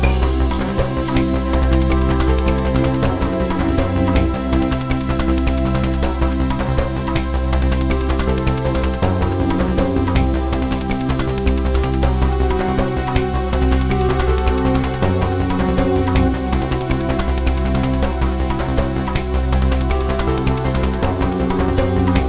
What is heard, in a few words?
bgm